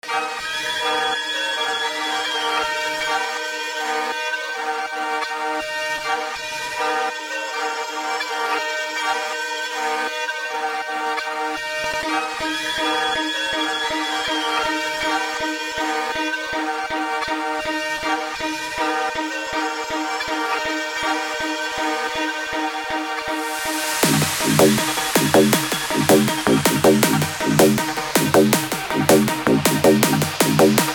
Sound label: Stutter Techno